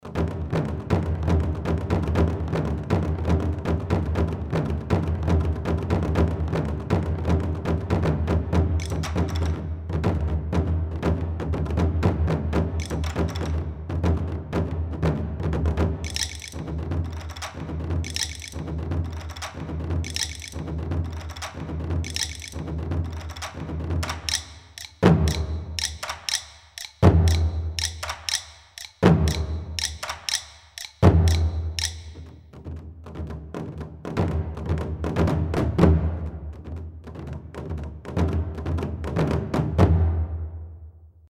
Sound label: Peking Drums